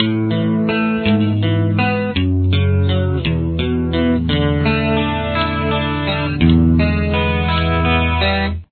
chorus, guitar

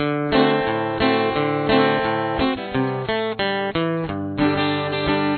chorus, guitar

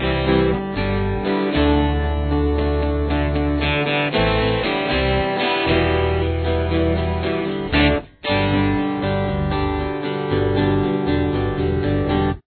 interlude

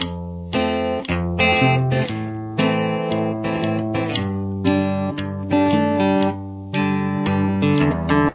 chorus, guitar

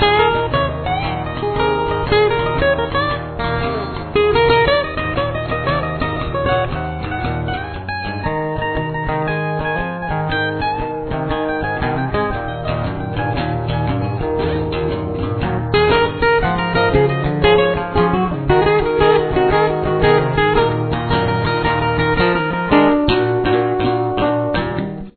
solo

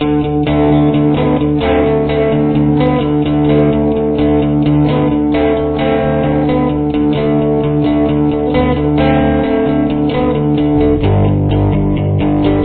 chorus, guitar

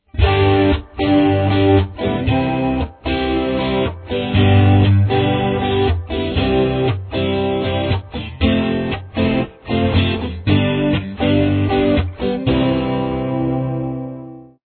C major, guitar

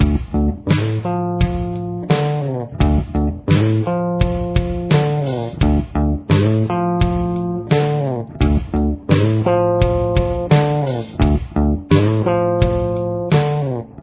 main riff bass

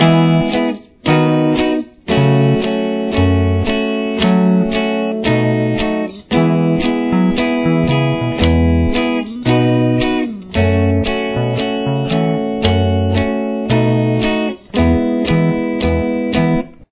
verse mix

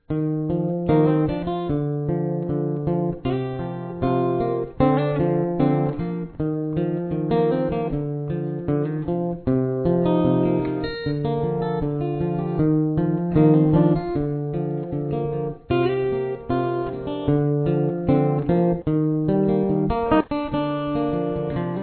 solo

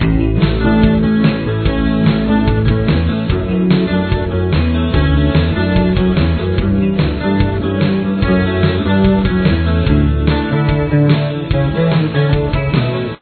verse mix

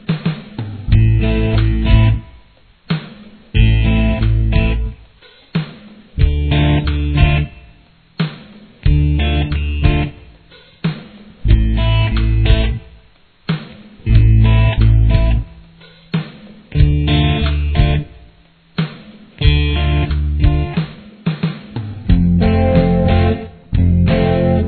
using scales blank

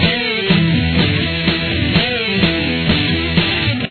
loop, guitar

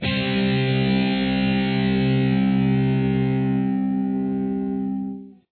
power chord

